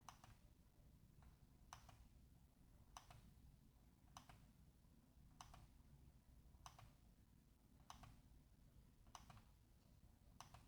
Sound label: logicool g502 x plus middle click sound